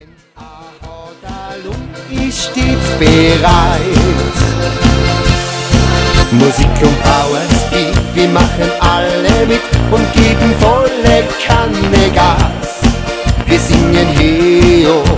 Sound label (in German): Musik power speed